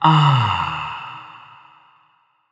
contented refreshed sigh; soundscrate

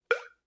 Slide Water drop Pop; SoundsCrate

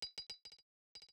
grenade roll; soundscrate

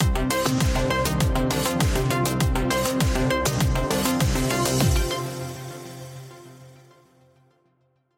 Synths and Groove Ident; SoundsCrate

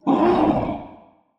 T rex grunt; soundscrate

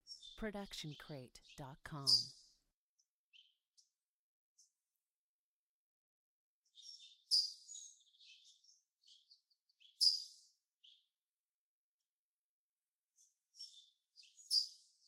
Multiple Birds Chirping; SoundsCrate

